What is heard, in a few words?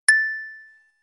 correct; grade1; animal body parts